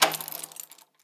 Key drop; Foley; SFX; 7rystan's 600+ FREE EDM SAMPLES & DRUMS

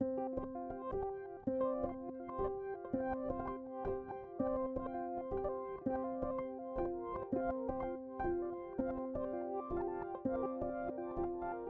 granular piano Cmaj; bespoke - Floral v.2

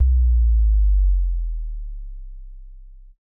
sub drop long; fx; bespoke - Floral v.1

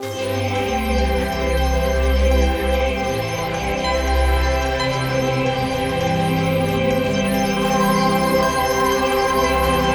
sound design layered input C; base pack

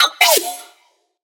Synth

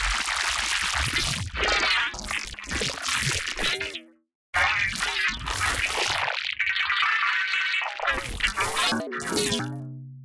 sound design forester; base pack

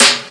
snare; 7rystan's Snare Pack 2018

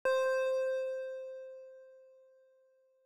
key; bespoke - Floral v.1

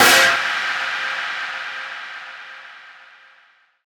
Snare

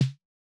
snare; bespoke - Floral v.1; drum oneshots

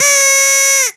"Yay!"; SFX; 7rystan's 600+ FREE EDM SAMPLES & DRUMS

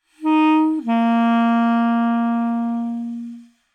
clarinet phrase Bbmaj 100bpm; bespoke - Floral v.2

